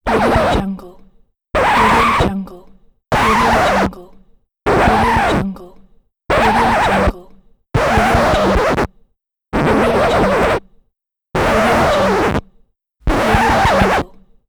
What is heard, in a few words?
scratch vinyl